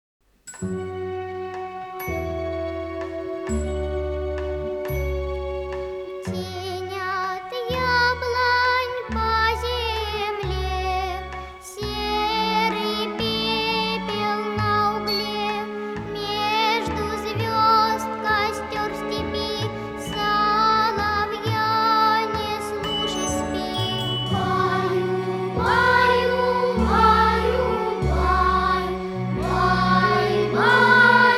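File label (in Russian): Колыбельная (Туркменская нар. песня), Большой детский хор; zarubezhnaya klassika i narodnye pesni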